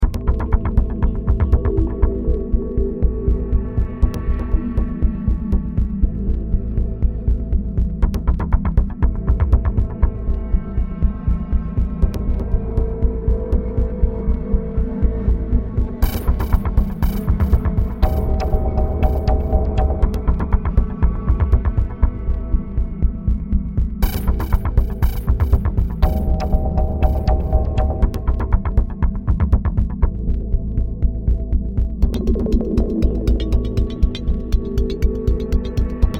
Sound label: Ambience